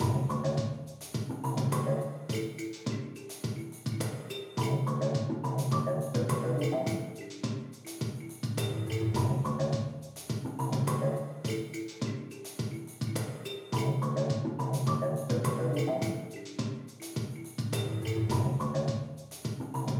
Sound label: BGM Plaza Shops Mission Gate